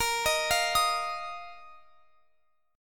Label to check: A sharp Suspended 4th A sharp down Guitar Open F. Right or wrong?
Right